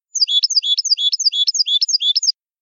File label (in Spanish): mecida